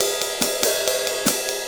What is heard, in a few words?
Ride and Hi Hat